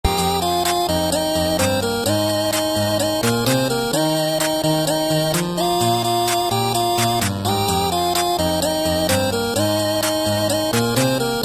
8bit

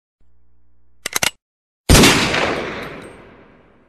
Desert Eagle

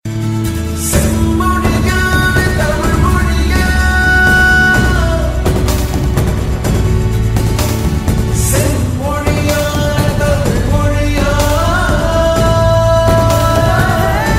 Digitalecho

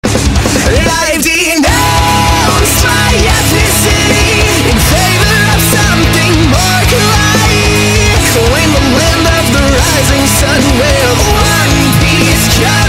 Metal; Weeaboo

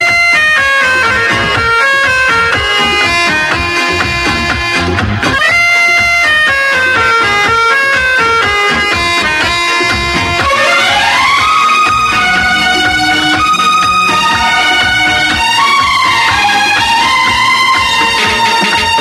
Indian Mouth Organ

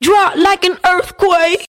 EarthQuake message

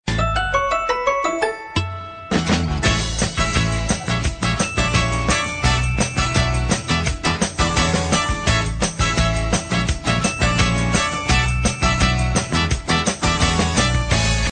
Pizzicato

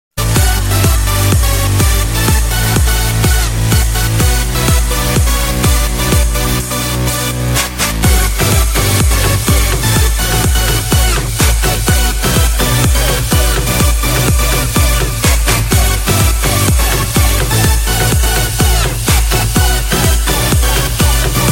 Loudest Ringtone

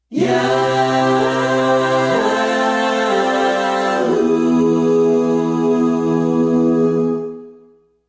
Yodel; New Yahoo

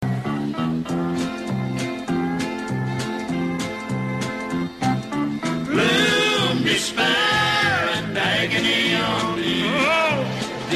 Gloom despair

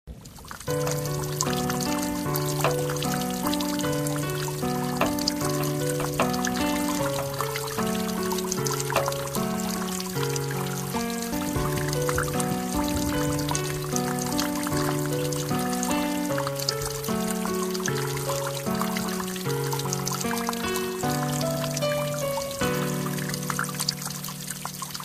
Water

